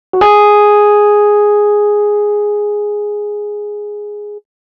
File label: Rhodes Solo, Cinematic Funk